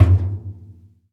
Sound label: IMPACT Metal Barrel Subtle; mono; IMPACTS; Universal Sound FX